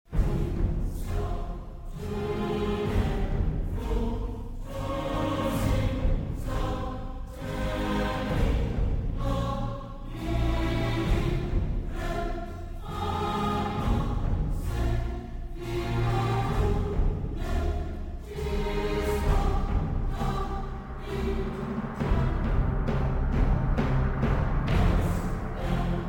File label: Trailer Themes (Choir)